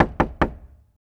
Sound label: Weird scary